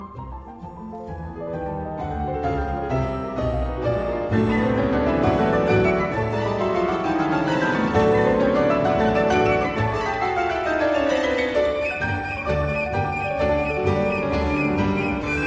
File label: Intro music; Herald